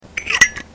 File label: Zippo fake, lighter